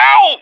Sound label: whack smash; cstrike; 35hp ruso